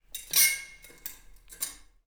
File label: Glass Scrape; 99Sounds; The Warehouse